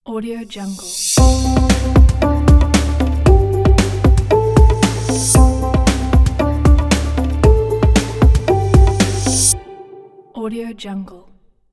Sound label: simple introduction logo